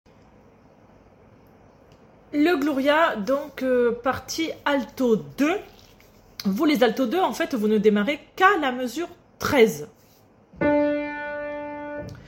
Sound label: Missa gloria alto